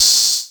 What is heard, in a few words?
Cymbals Open HH; Vengeance Samples